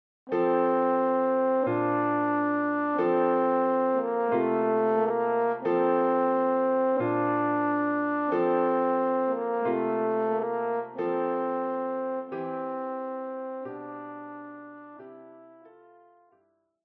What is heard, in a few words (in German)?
O du fröhliche